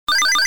Harpoon, arcade; Dig Dug

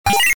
Rock Fall, arcade; Dig Dug

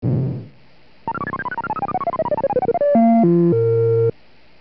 Death; arcade; DK

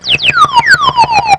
Bird Chirp

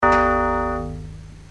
coin

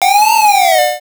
Nicelander Toss; arcade; FIFjr

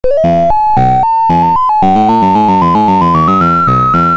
Beginning music, arcade; Mspacman

